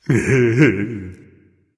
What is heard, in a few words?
content, voice; Smite; ymir skin12